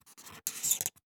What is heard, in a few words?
handwriting marker; copics; soundbits-soundfx